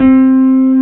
piano 72; sc88pro; tumbledemerald

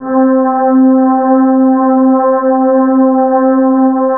sc88pro string ensemble; tumbledemerald

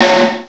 sd90 solo snare; tumbledemerald

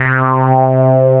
mega bass; trinity 30303; tumbledemerald

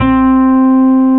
sc88pro nylon str guitar; tumbledemerald

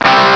guitar separates power chord; tumbledemerald

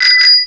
bicycle bell; tumbledemerald

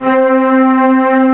sc88pro string ensemble 72; tumbledemerald

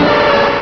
slowbro, cries; tumbledemerald

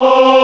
classical choir voice ahhs; tumbledemerald

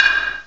ethnic flavours ohtsuzumi; tumbledemerald

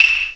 ethnic flavours hyoushigi; tumbledemerald

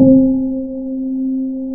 sc88pro piano1; tumbledemerald

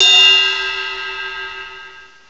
dance drums ride bell; tumbledemerald

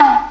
ethnic flavours kotsuzumi; tumbledemerald

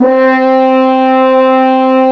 sc88pro french horn 60; tumbledemerald

